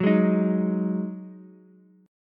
D sharp Minor Double Flat 5th D sharp, down, Ukulele; strummed; chord